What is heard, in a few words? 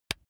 razor attachment mount